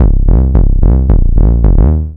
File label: Bass Line; Eclectic Electronica